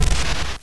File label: flap wings; mazeofgalious